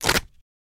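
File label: Perforated Paper Tear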